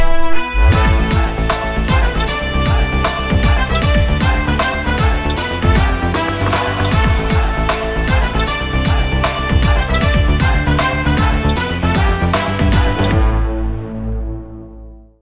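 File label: Short Ringtone Mass background music; DEVARA; NTR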